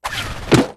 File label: barrel spin; cooper